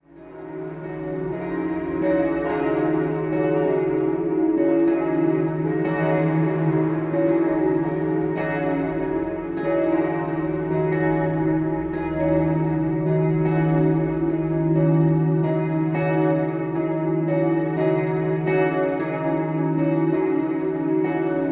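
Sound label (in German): geläute erstes mit holzarmaturen zweites mit stahlarmaturen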